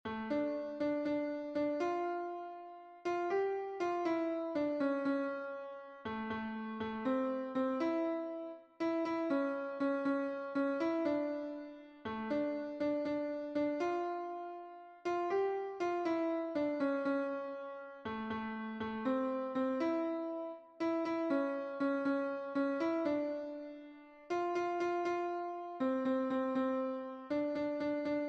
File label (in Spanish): contralto, himnos en piano; cantos del camino